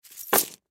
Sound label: Coin Purse Drop; Money (Sound Effects:Objects); Blastwave; Foley